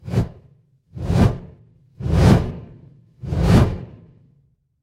fire electric whoosh flame

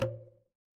cartoon bonk

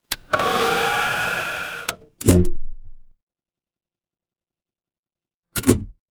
mechanical lever

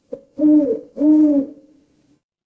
animal owl

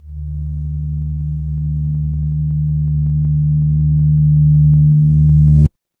fire electric hum

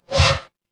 ui swipe

